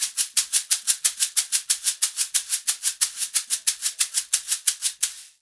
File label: shak, percussion loops